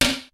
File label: kicks snare, kid; Kid Nepro; Elite; KICKS SNARES